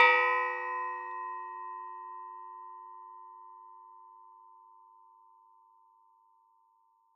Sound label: Metal Teapot Stand Strike